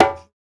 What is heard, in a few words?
African Perc